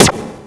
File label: arm Lazer; PC Gamer IT CD 48; Big bang